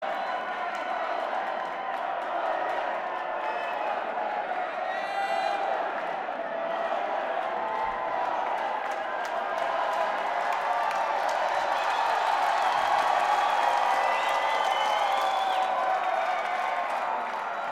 Crowd Banter

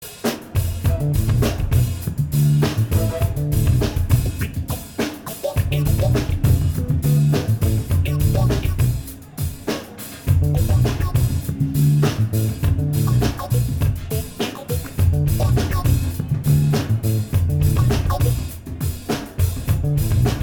Improv Jam

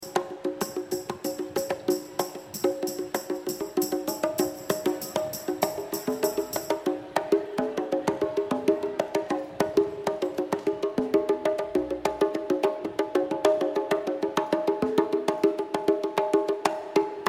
Drums

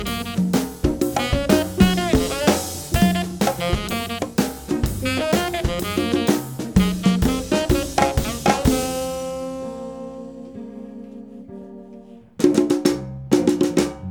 Percussion Shuffle

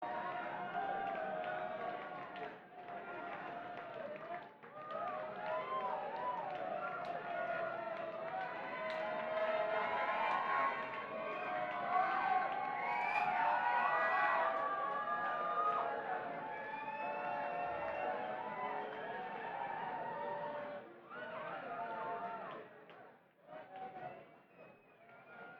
Banter Crowd

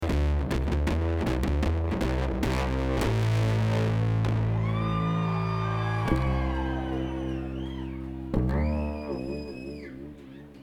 Traffic Jam

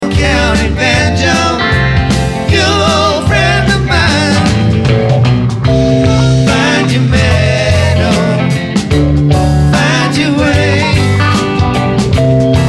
Banjo

